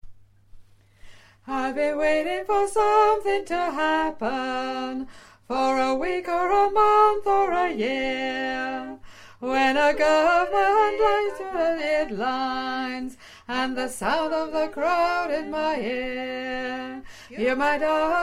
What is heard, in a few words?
2 mix bass